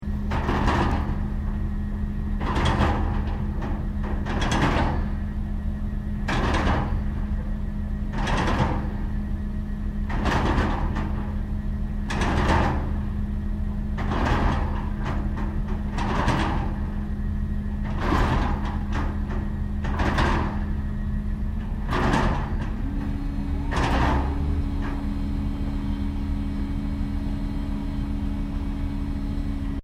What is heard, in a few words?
Construction Site Drilling Rig Operating Loop, Japanese construction drill site